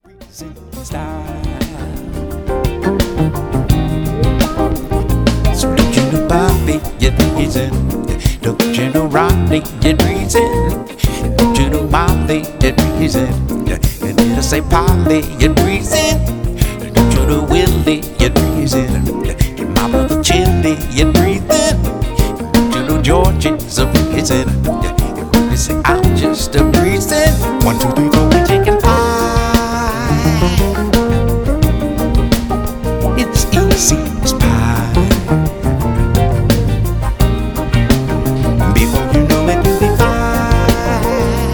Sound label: guitar